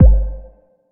Beam Hit

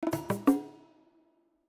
stinger Character Swap

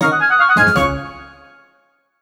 Tank Wars Win; Mini Carnival; Android, iOS

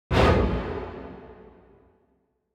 Capture End 140 4-4; Planet of Lana

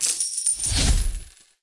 Mystery Box Coins Added; Subway Surfers City; Android, iOS

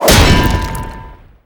Anvil Rain Impact; MagicItem AnvilRain SFX Impact 01

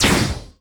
Wall Bound; Disney Star Smash; Android, iOS, Mobile; Spball

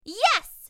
Finish Level

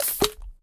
Pick Up Fizzle

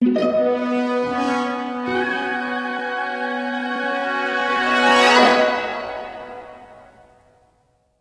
Petticle Bonding

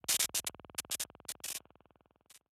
Electricity Status Effect One Shot

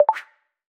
Rotate

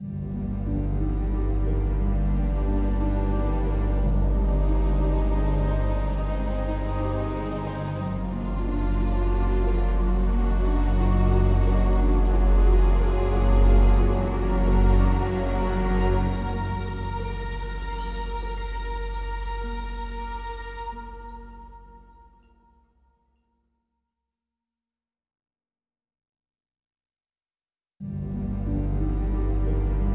mysterious

